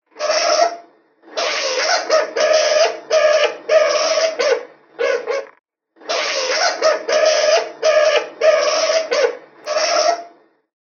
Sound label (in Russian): skuns golos